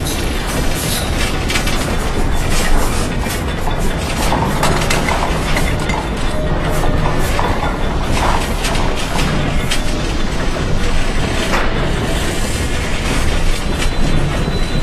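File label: Ambient Gears Loop; silent hill homecoming 2008 gamerip pc ps3 x360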